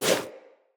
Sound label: Wall Jump Foley; Tenno; Footsteps; Wall Run